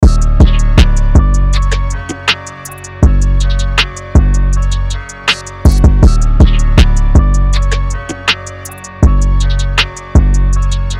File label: 160 BPM Fm; UNISON DEMO; Sabretooth